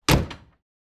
Old car door closing

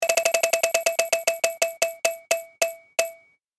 Spin to win; shorts sound effects

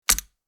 Metal miniature game board map single move

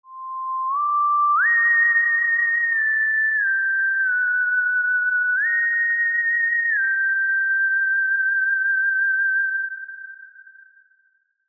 Spooky halloween theremin

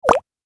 Playful bubble pop

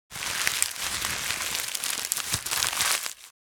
Rustling small paper bag

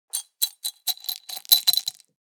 Pouring pills into hand glass vitamin bottle; medicine

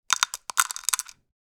Pill container putting pills inside; medicine

